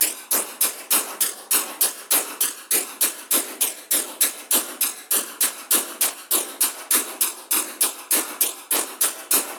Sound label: 100 Perc Steam Hats; Zero-G; Future Wave